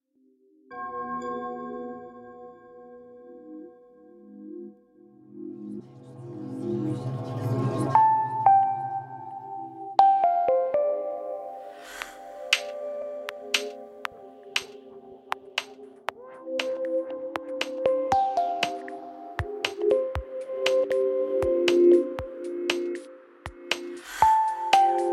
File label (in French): identite sonore; samsung